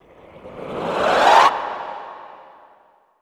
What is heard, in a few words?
SWELL FAST, Classical Choir; Best Service; Extended Classical Choir; SWELLS